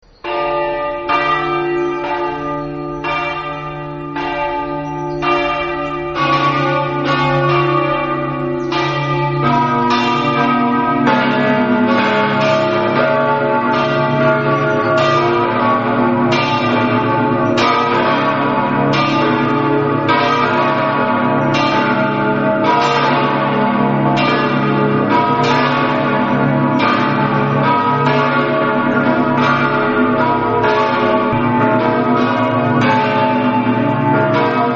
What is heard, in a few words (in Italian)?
tricesimo, campane